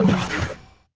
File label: Stocked